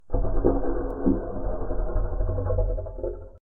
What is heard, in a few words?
Pipe Noise; Lethal Company; Windows